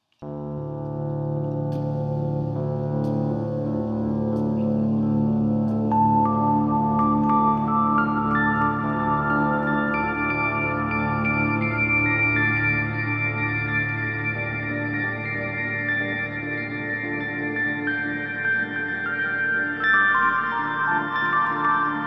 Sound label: Christmas Morning Bells